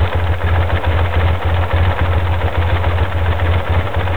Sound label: lean idle; Tune Up; Carburetor Air Cleaner; FCR; setting FCR